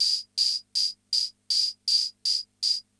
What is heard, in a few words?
CR78; Best Service; XX Large Most Wanted